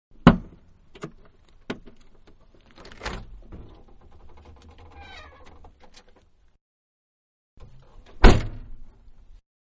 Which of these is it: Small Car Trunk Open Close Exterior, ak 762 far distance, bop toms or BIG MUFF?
Small Car Trunk Open Close Exterior